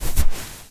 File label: footstep sand walk; ProSoundCollection v1 3 MONO Footsteps